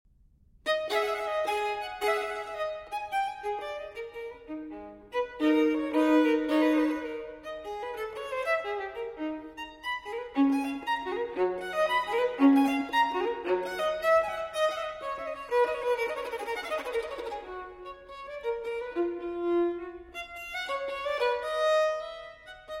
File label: Fantasia No. 12 For Solo Violin in A Minor II. Vivace; Telemann